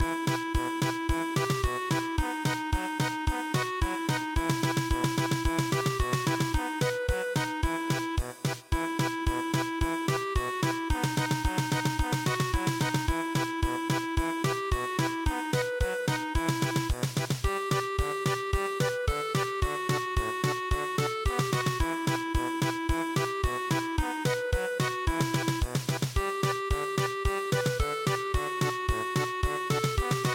polka; kawaii minka

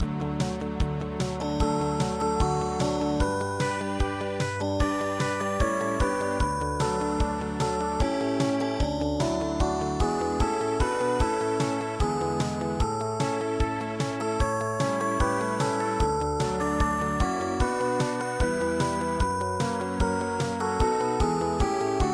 ballade

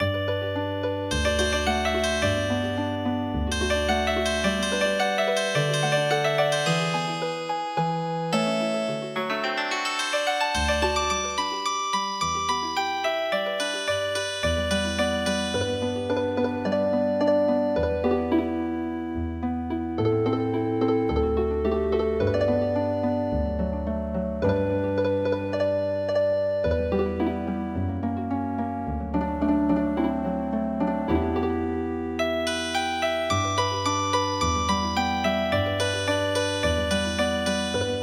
Healing Harp

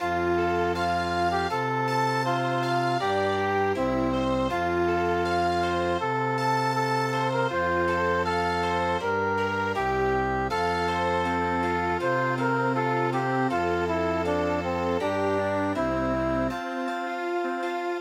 Chamber Music; Climbing Mountain

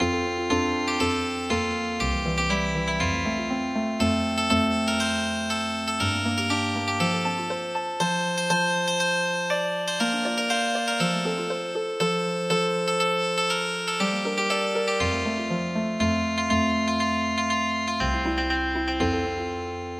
Healing Harp, traditional; The morning dawned

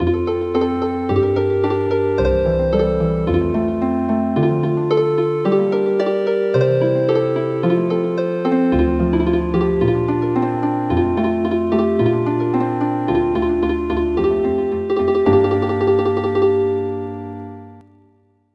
Healin Harp; Pots Pots

